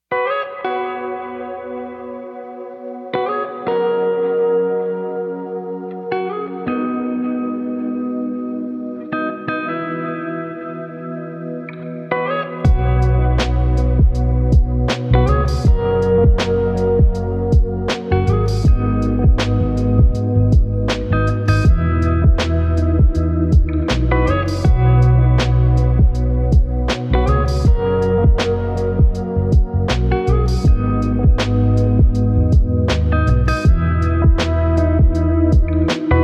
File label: Lofi